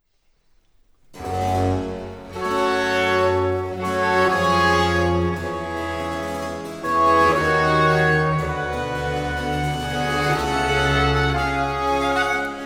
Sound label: Concerto in G Minor for 2 Oboes and Bassoon, Grave et detache; TWV 53 g1